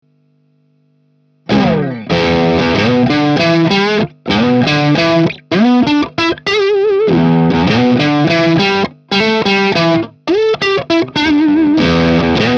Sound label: hx tubedrive